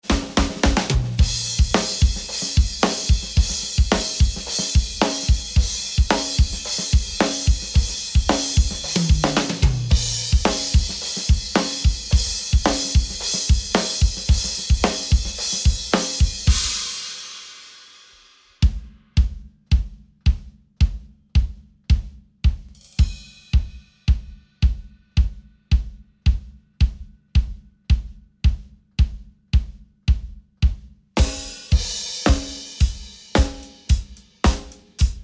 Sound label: Rock